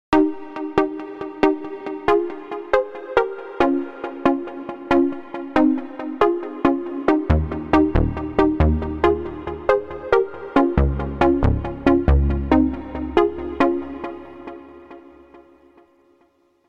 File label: Club Pizz, Korg